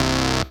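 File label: Rave Synth, Dance Mega Jungle Rave; Best Service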